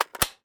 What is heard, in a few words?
AKM Mag IN